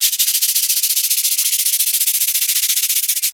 SHAKE; Houseworx; Drums; Perc

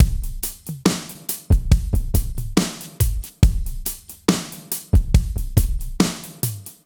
SloMo Beats, Loops 140 BPM

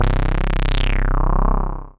C0, synth; E-MU Producer Series Vol. 6 – Analogia Project; Strictly Synthe1; MILTON B

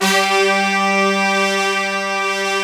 FULLBRS G3, Synth City; FULLBRASS

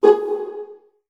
Virtuoso Strings PZZ G#2 R; ILIO; Kirk Hunter Virtuoso Strings VOL-2

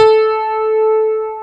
CP-80, Yamaha CP-80; Greytsounds VOL-1

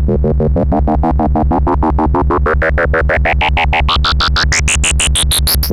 Moog D#3; Hollywood Edge; Giorgio Moroder Rare Synthesizer Collection; MLTI.MOOG

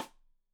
Side Stick Stereo; Deeply Sampled Drums; SMDrum

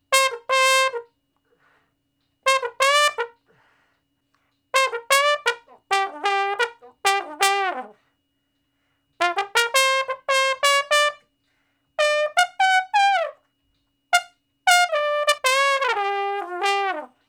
Trombone Shuffle (G); Crimson Blue and Fabulous Horncraft 4 RnB; Horncraft for R&B; Solos

